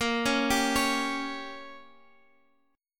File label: A sharp Minor 6th A sharp down, chord